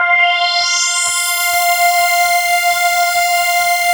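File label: JP8 filter sweep, Jupiter 8; Big Fish Audio - First Call Vintage Keyboards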